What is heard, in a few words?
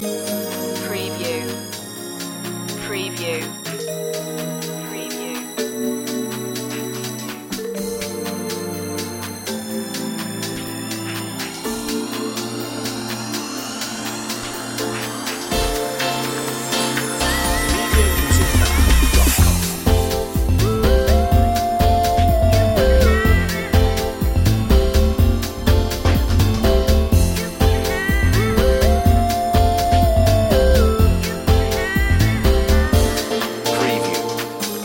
vintage beats loop